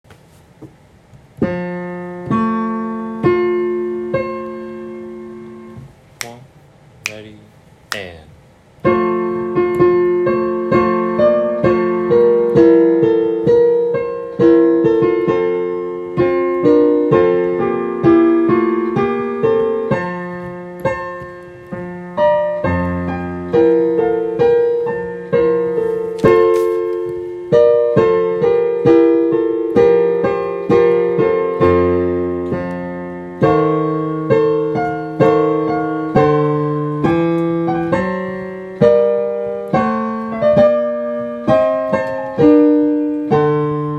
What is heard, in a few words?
kyrie accompaniment